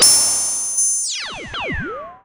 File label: laser